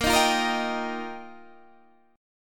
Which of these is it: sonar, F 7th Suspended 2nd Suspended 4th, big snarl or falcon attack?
F 7th Suspended 2nd Suspended 4th